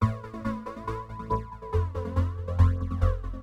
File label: TEKNO 140; Techno2